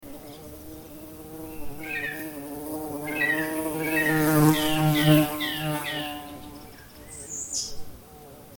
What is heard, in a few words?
Bumble bee sound